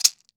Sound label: MARACAS; AKAI S-Series CD-ROM Sound Library VOL-5